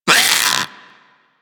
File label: Infested Splitter Split Top Vocal Pain; Warframe; Grey Strain